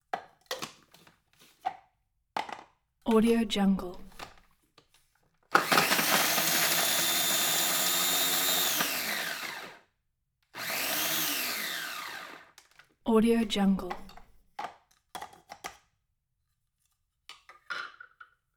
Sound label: electric small food processor chop carrots